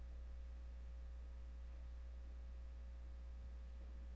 breath

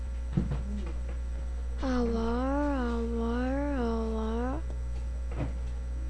howl

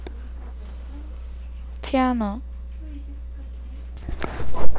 piano

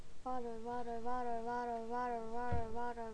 bottle

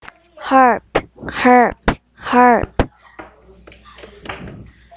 harp

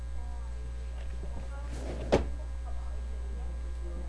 knock

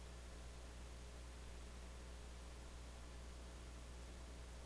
shake

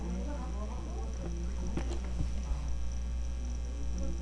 bagpipe

